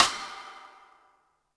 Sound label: snaredrum, Yamaha RM 50